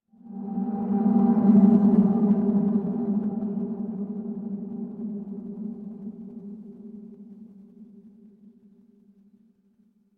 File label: horror wind whisper